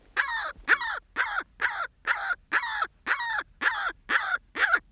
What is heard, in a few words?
skua sound